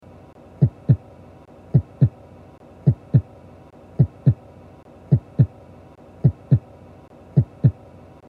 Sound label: Heartbeat normal - Produced with my boyfriends throat and edited in rhythm; sound production